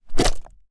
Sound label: Filth Squash